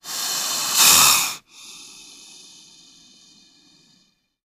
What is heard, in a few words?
Snake, Bull Inhale Then Exhale. Close Perspective.